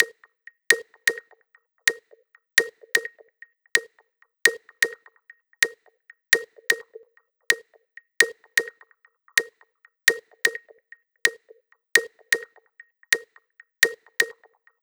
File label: Techno Kit Melody; Mutekki Media; Vengeance Electroshock Vol.2